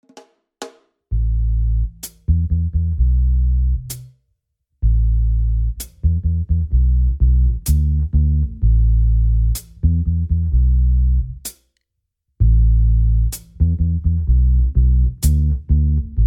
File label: Bass Line Play Along